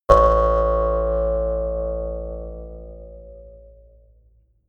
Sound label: mouth harp